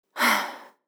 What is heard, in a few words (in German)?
seufzen